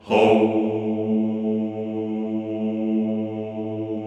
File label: CHO A1; Sound & Vision; Gigapack I CD 2; CHO Soumm+Houuu